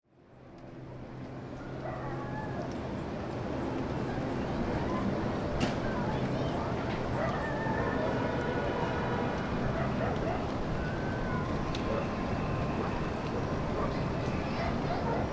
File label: La Tigre Ambiance, Tegucigalpa Honduras